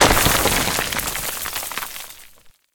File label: Breakable Wall